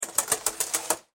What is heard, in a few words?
Household Shower Curtain Close Slow; Bathroom